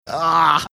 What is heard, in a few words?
aaaaagggghhhh